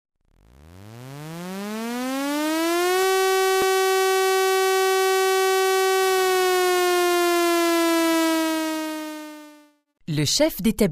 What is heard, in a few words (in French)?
Sirene et voix annonce debut de PPMS